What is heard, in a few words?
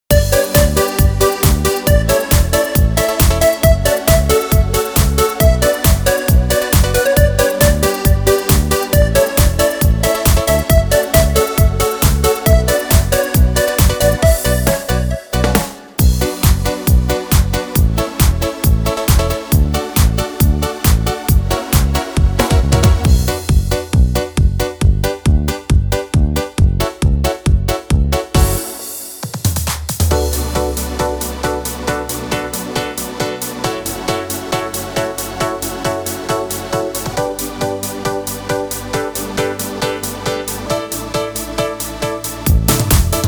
Beat